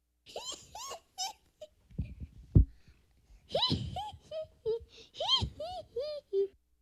junge, Lachen

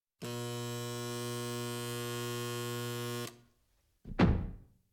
stromstoß, dumpfer aufschlag; Katastrophen

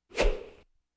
stockhieb; Urwald

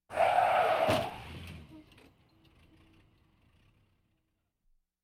auffahrunfall mit reifenquietschen; Auto-Zweiraeder

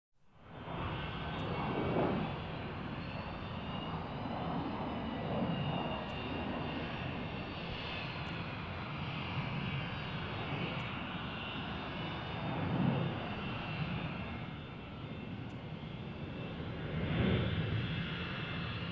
rollbahn, Am Flughafen

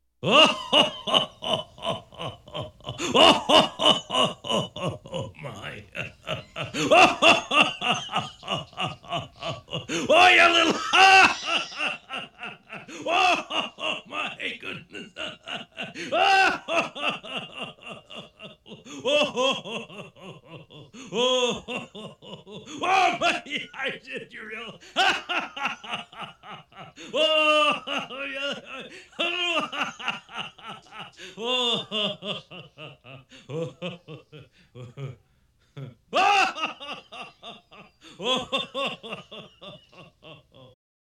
weihnachtsmann, Lachen

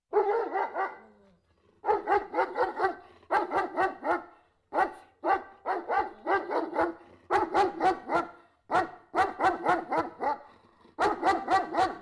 herumrennender, bellender hund; Auf dem Land

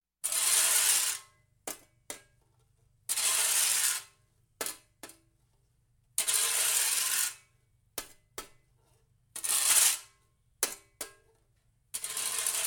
kehrschaufel; Alltagsgeraeusche

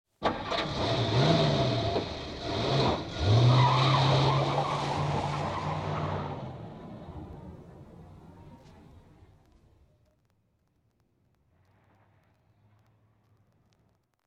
kavalierstart eines autos; Großstadtgeraeusche

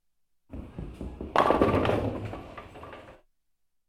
kegeln - wurf

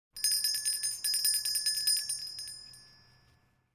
schulglocke oft; Auf dem Land

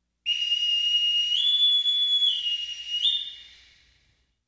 bootsmannspfeife beidrehen!; Hafen

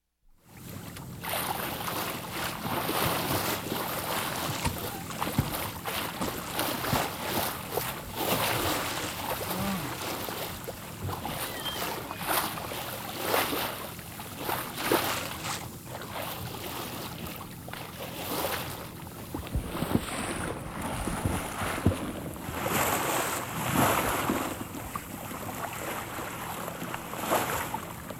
segeln in brandung; Sport

